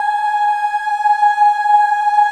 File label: G#4 child ah, choir; USB Soundscan vol.28